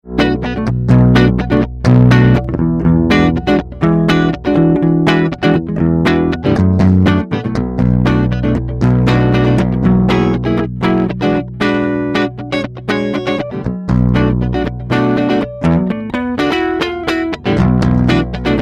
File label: guitar clean sample, bass guitar; cephisso